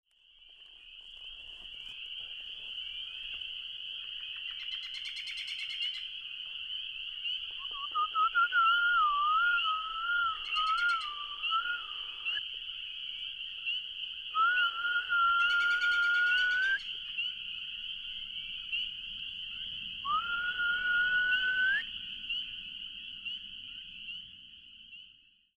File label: Mouth Sounds Night Lake; Wisconsin 2019